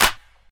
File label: clap sound b key, Royality free clap single shot tuned to the B note. Loudest frequency: 3834Hz; percussion single hits one shots